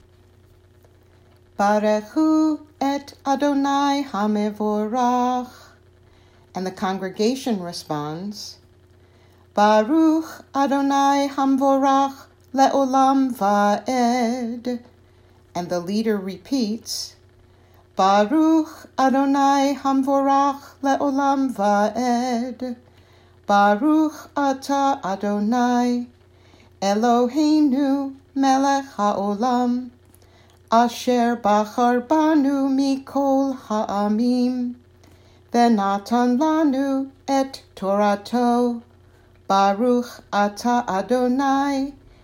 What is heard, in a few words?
Blessing Before Torah Reading